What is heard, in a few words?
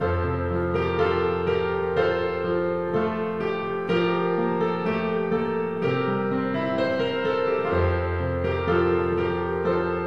Kyrie eleison